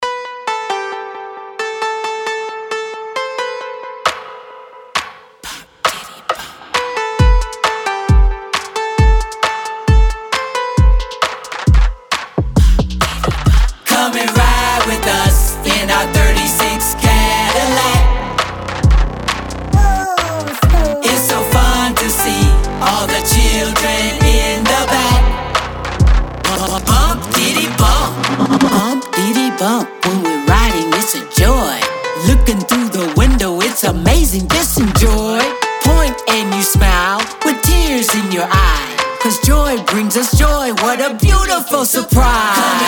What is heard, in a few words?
JINGLE; toddlers